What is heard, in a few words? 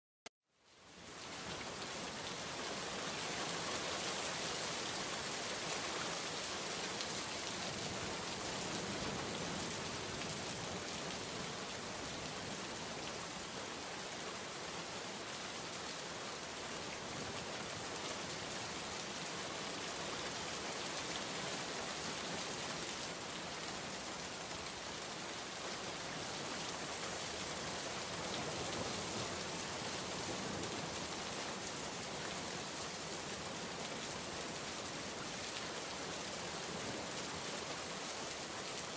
rain sound effect